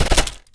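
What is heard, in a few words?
droid large fall Dirt; droids robots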